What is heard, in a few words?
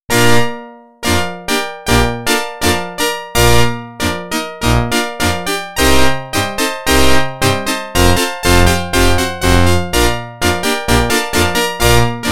Organ; NHL 94